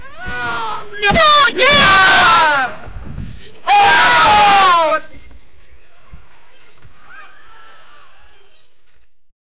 someone screaming

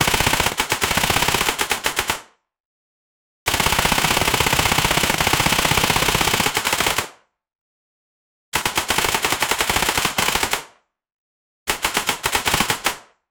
shots from the machi

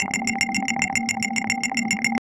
loop 110 bpm aggressiv; 3s; rhythmic

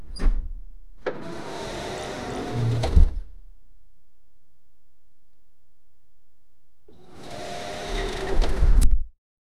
automatic sliding glass door

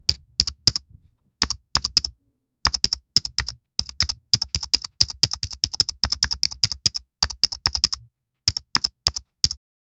slow clicking on keyboard

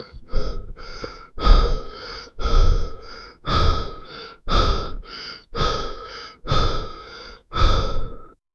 the sound of a mans, motion

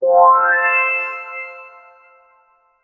a rising tone that convey, achievement

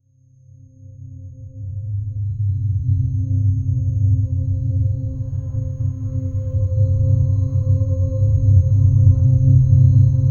a haunting psychological

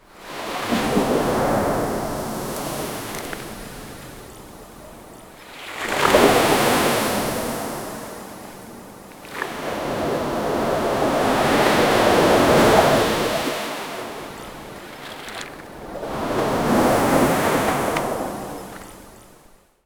sea waves crashing on roc

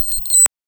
electronic bip

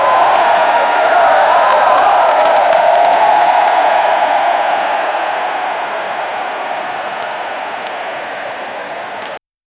huge concert crowd

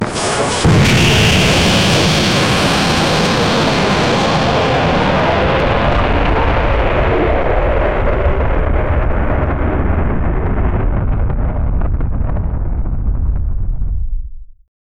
a rocket launching into s; blast

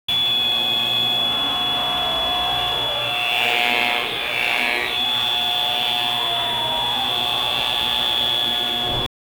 a professional crossfit c, competition

